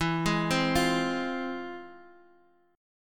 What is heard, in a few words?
F Minor Major 7th, chord